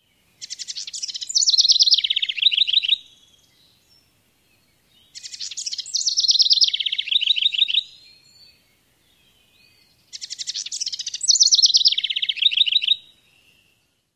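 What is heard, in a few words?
song, house wren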